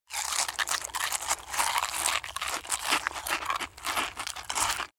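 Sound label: quickly crunching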